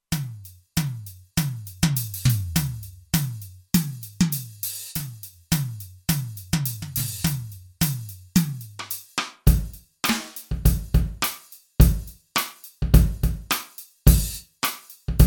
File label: Garage Kit, Kurzweil K2500X; Factory Programs